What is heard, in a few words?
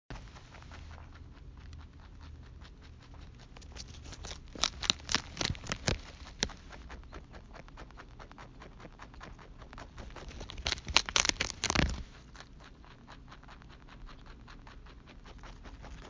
Chewing; Smudge